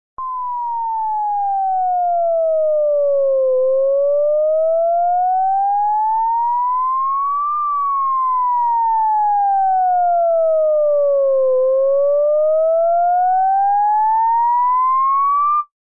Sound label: ambulance siren ambulancia